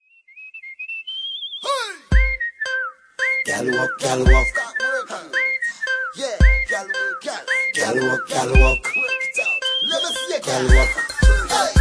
trini soca

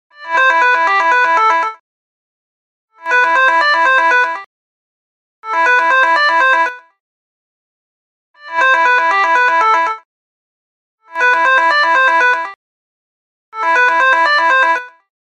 Network Sound

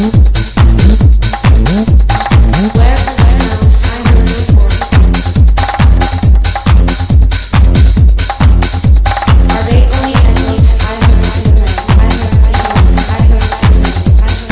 ARPEGIA

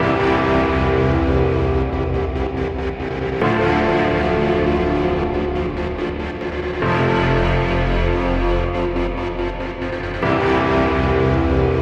cool piano ringtone

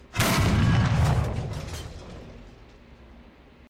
Tiger Tank Shot

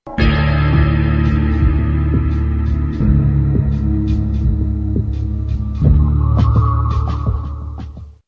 logo sound; ringtones; games; valve

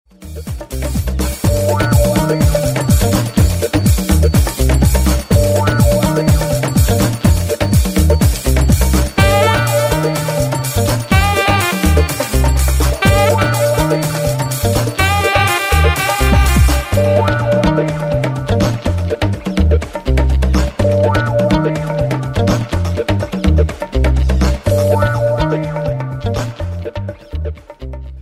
htc modern

